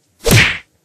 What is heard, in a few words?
punch; ss13